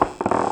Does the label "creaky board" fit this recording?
Yes